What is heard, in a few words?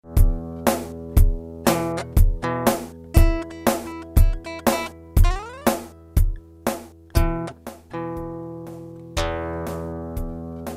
Loop Test